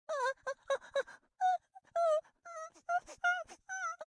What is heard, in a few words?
crying sound; sago mini